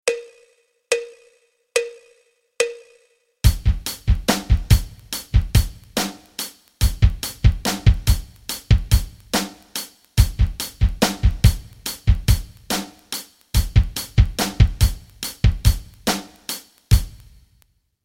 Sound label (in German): rock rhythmen